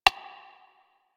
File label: Shawzin Metronome Bar; Warframe